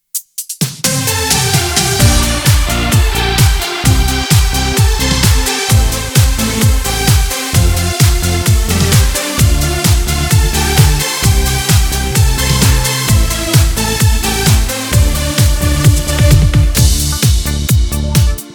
remix genos

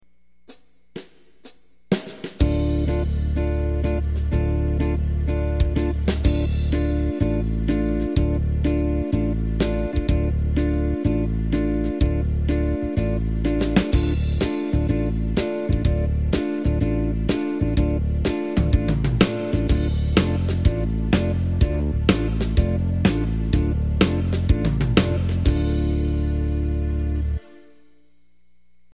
swing; yamaha

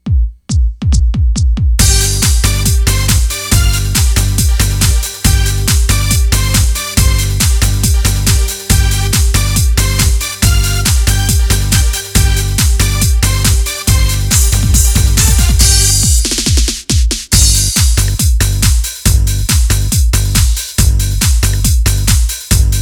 techno; Female; yamaha styles

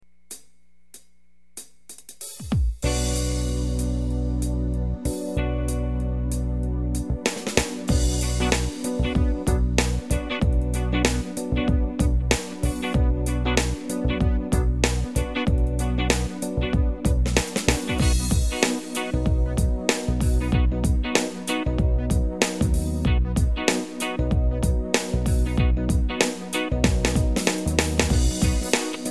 hiphop; yamaha

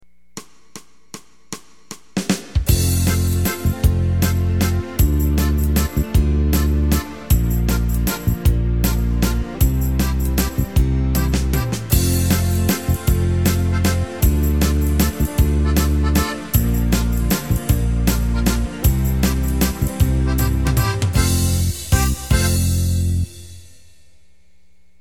Valse; yamaha

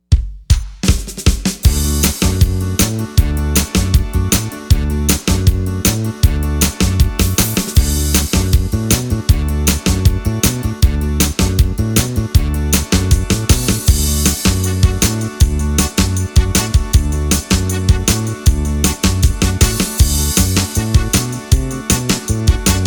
twist; yamaha

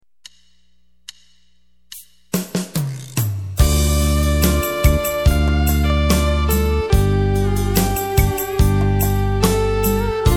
Ballad; yamaha

